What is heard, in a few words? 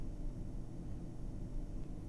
Fridge loop; Phasmophobia